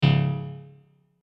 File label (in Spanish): Fallar Disparo; SFX Gameplay 3